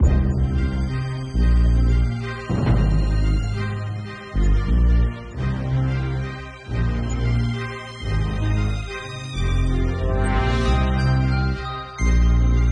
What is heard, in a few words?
music menu